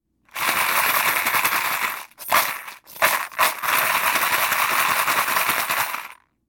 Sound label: Shaking Coins